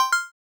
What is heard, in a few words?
SE Game retro select